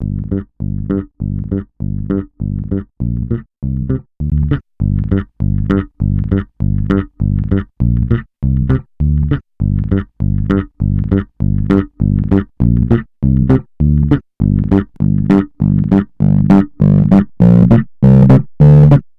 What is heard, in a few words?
Bass; Solid State Logic 611EQ serie API-500